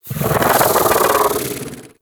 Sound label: Bug Vocal Alerted; Characters; Warframe; Hunting Critters; InfKDriveBug